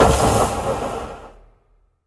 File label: explode, antlion guard; hl2